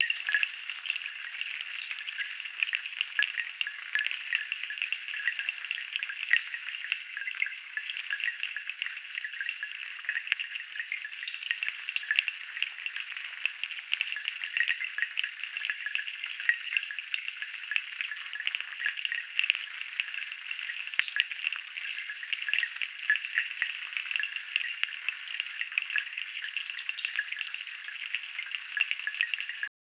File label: a seamless loopable cave, rock fall